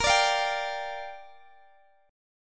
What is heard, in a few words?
A sharp 6th, Guitar Open G